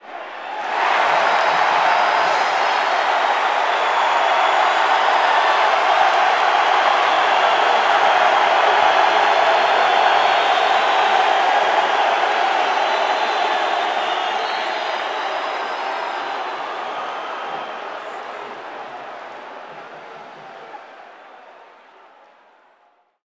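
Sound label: Basketball Crowd Cheer; SFX Kit; Crowds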